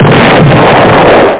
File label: sfx explosion; 8,363 sample rate; Amiga 8-bit Sampled Voice